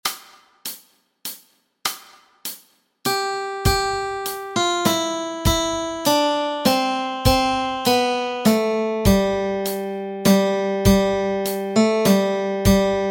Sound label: melody